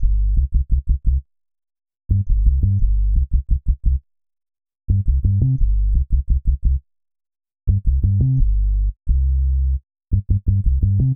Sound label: Eight Vibes, Hip-Hop